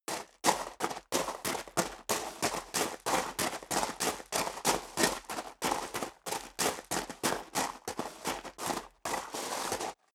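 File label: step dress boots gravel medium walk sequence, footsteps; schritte; menschen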